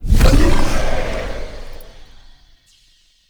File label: Cannonball Thrust; Powers; Bromius